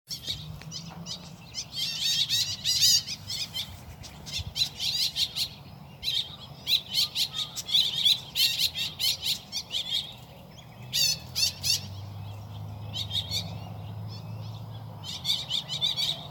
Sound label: Woodswallow Sound